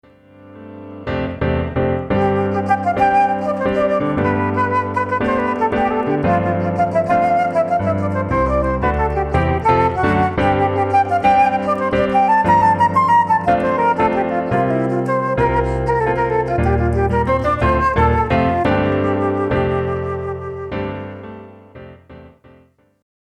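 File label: Jig Flute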